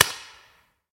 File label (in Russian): gluhoj shelchok s ehom